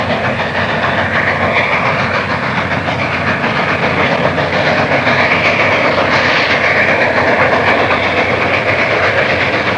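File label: old locomotive, transportation; Web Clip Empire 150,000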